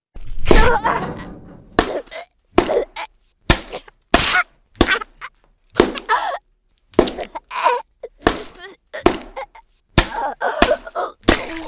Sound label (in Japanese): 叩き潰す; 効果音あり